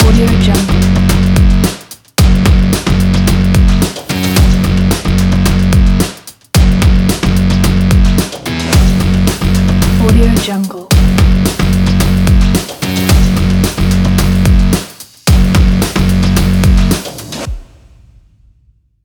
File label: extreme logo